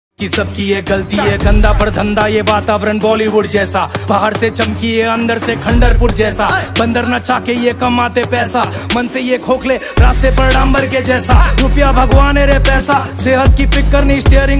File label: Punjabi Beats